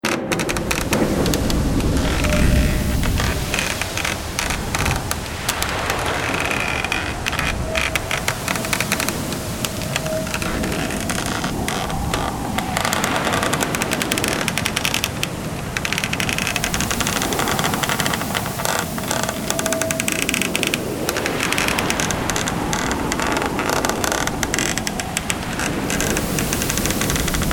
Ship In A Storm, Atmospheric sound inside a wooden ship at sea creaking in a storm.; Boats; Vehicles